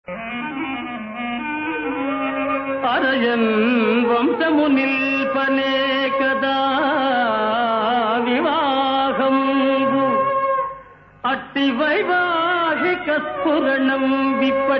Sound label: Padyam; Harichandra